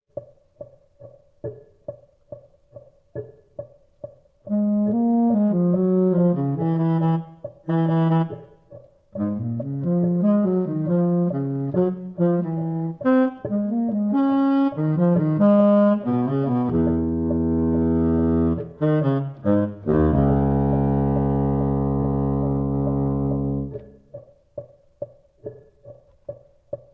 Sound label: jazz bari latin